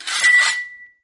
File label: sabers out; jocly; mana